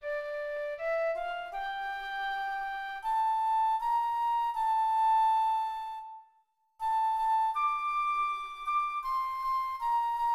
FLUTE; Psaume 129

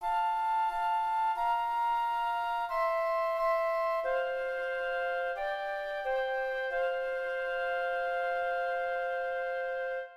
FLUTE

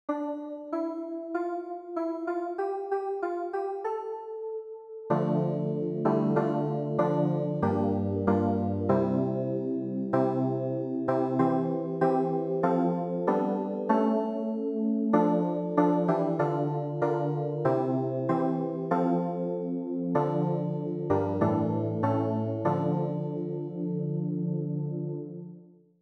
CLAVIER; ANAMNESE St Jean

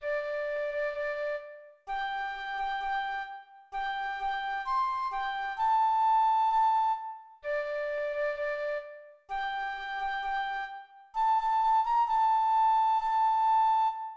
FLUTE

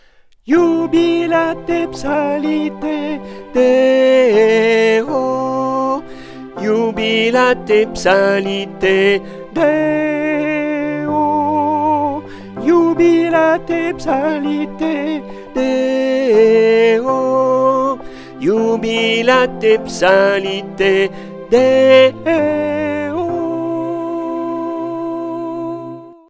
Coda TENOR

